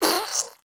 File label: alien; creature